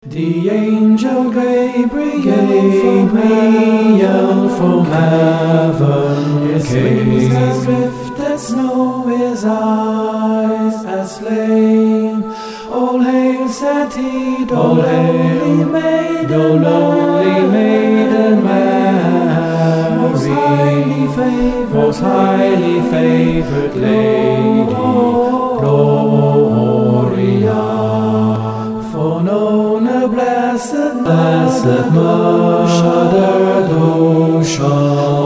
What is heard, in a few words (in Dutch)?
koor; kerstnummers ingezongen